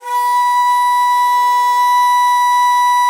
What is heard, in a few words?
BON DI, Winds, Old World Instruments